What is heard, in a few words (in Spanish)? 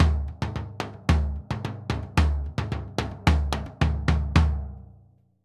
Bombo Murga 110, Drums & Percussion, Latina; Best Service; Festa Latina VOL-1